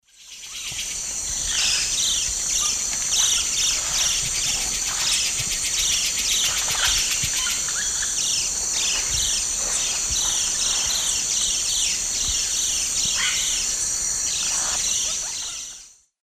Iodopleura isabellae, group chase calls; S. America; Eastern Lowlands

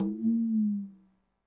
conga, latin

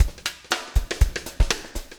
breakout 120, 120bpm, loops

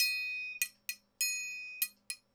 triangle 100, latin, 100bpm; Sound Effect Library

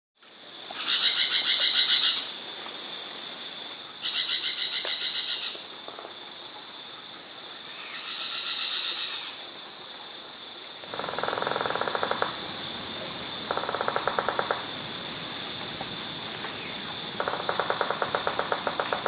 Smoky-Brown Woodpecker; Veniliornis; S. America

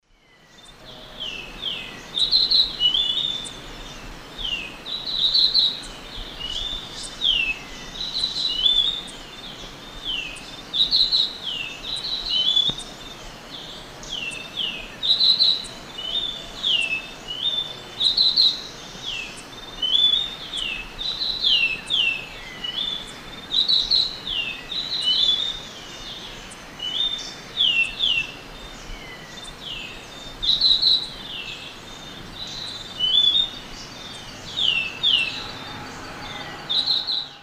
Euphonia xanthogaster, duet; S. America; Eastern Lowlands

